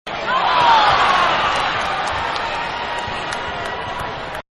Crowd Disappointed; Crowds; Backgrounds; Manhattan Production Music

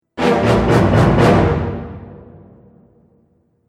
Action Film Dramatic Event, musical

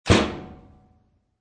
Washing Machine Door Close

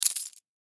Key Ring Carabineer Drop; Key Ring Carabiner; Latches; Foley; Blastwave

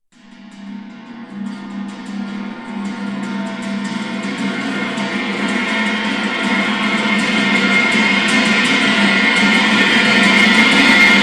Cymbal Rolls Various; Cymbals; Musical; Tom Hutchings

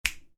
Click Fingers, Single Click; Snapping; Human; Snapping Sound Effects; Snapping (Sound Effects:Human); Stuart Duffield

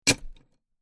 Chain Impact Chain Metal Impact; Metal Sound Effects; LYN103 package of Chain Sounds; Lynne Music

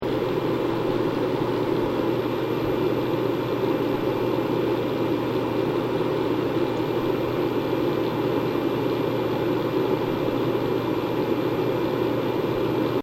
device USB external HD fan operate loop, PC fan

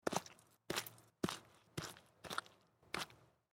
Footsteps Mud Walk; Footsteps (Sound Effects:Human)

